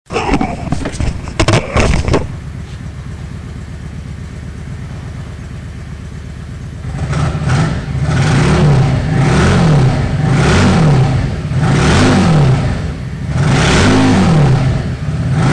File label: xvs650 mod, exhausts